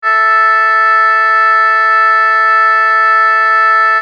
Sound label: oboe ae, acoustic